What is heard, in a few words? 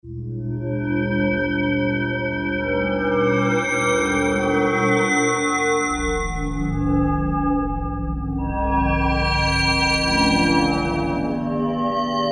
Waterphone, Alesis QS8; Factory Preset examples